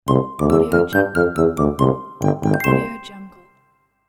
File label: cute kids tuba bell conclusion; cartoon